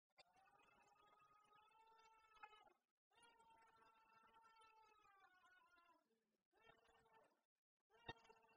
man screaming

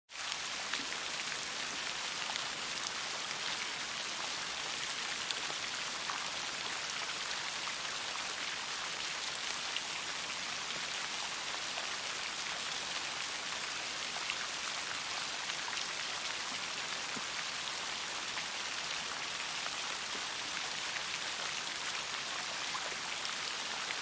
rain on concrete sound

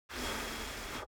persons mouth blowing

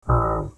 yak imitation sound

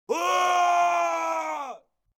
scream

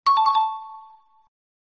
check mark

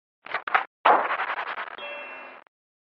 deep freeze sound; fallout 4

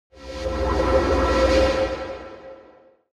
sci fi space machine sounds

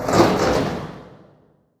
prison metal door close

